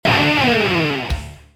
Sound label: squeel